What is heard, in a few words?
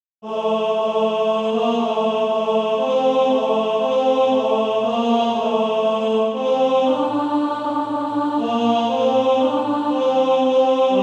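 tenor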